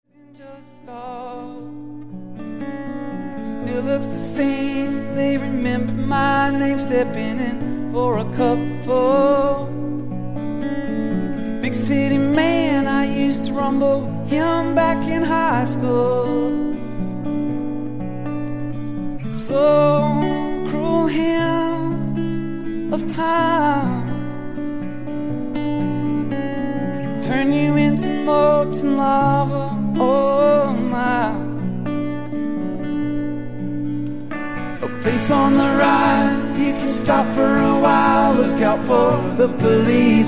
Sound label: acoustic